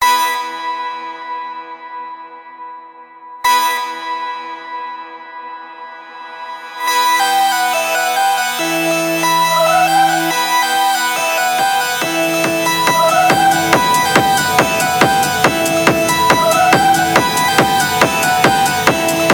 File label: techno funk